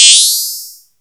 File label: KIT, DRUMS & PERC